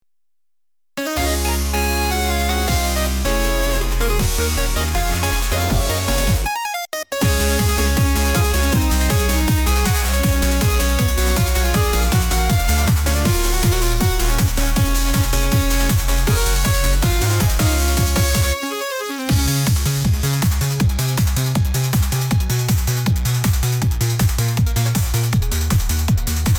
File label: chiptune ending